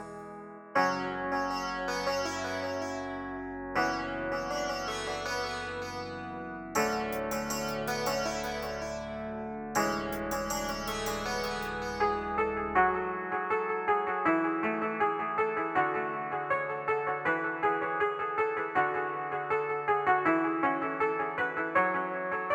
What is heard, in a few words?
A small classical-ish music piece, using some odd instruments; for something like a temple or holy area